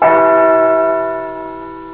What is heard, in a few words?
bell, cuckoo clock